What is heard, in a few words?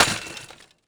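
concrete impact; Halfpipe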